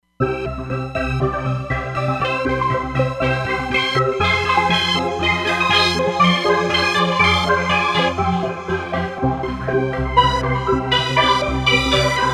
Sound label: Mighty Pulses, Roland Fantom XR